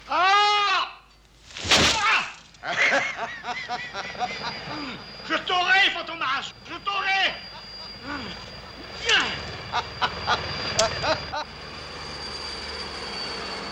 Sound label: laughing; fantomas